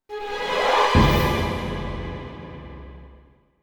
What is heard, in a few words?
thrill jingle